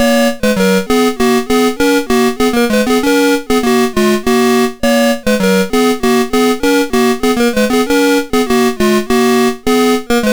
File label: Digi Hits, MOS6581R4